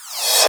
REV CRASH, Drums; MISC; USB Soundscan vol.11 - Drums Dance & Groove; AKAI

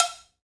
PRC COW SML, Cowbells; Roland - Rhythm Section; Latin 1

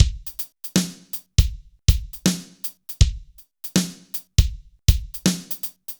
BPM 80 AMB; AKAI S-Series CD-ROM Sound Library VOL-4

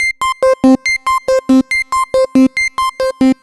Arp 140bpm C, Trance

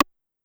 Rim, Clavia DDrum AT, Pitched Down

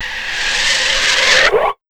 Big Beat Drumloops; USB Soundscan vol.25; AKAI

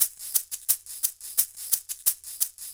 Shake 175, Drum&Bass Perc Loops; EZRollers

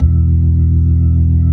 B-3, Jazz

